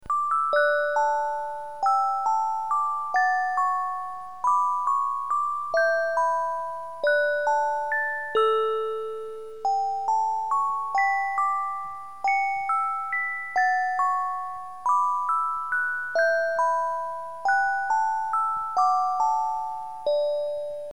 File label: Melody